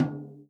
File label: TOM, Real Drum Kit; Best Service ProSamples vol.43; JUNGLE KIT